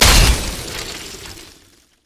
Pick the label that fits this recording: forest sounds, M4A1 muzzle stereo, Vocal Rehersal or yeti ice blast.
yeti ice blast